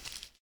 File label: leaves